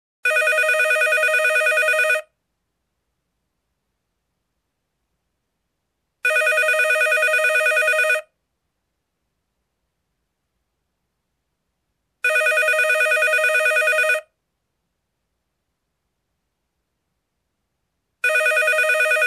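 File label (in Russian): телефон электронный звонок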